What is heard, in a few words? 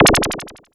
BRIGHT, PERC HITS; Zero-G Ambient 1